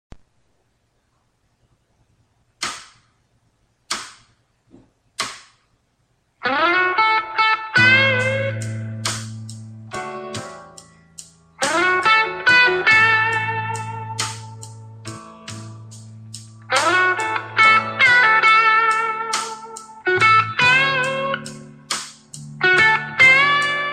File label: slow blues solo